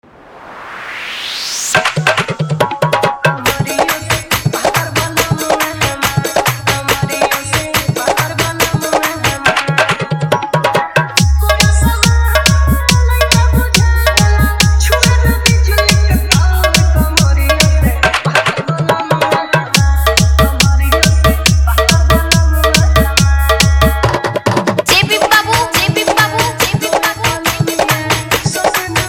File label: Bhojpuri Dj Song Remix Dj Edm Bass Mix